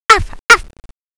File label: arf